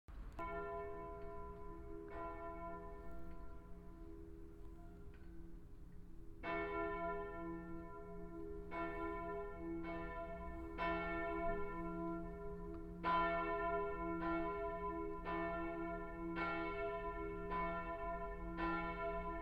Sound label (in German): vaterunserglocke